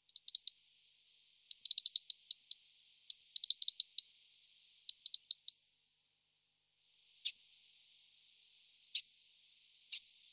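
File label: zaryanka golos, European robin voice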